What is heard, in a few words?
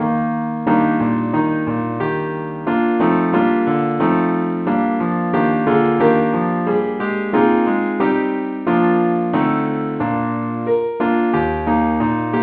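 keyboard only key E flat; saintthomas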